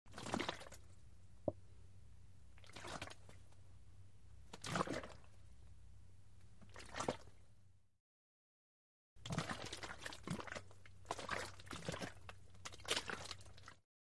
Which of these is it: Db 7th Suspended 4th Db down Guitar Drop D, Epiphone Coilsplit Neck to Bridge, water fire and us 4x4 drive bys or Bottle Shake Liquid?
Bottle Shake Liquid